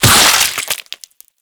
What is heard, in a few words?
Impact Gore Large; Warframe; Bullet Impacts